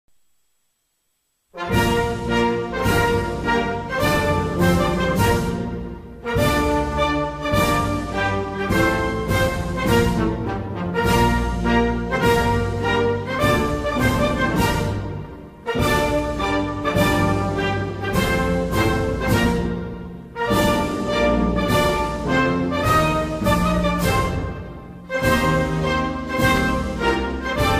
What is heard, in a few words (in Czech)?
hymna Tuniska instrumentalni